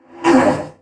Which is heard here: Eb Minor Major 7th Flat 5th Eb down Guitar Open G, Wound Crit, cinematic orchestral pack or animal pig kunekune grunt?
Wound Crit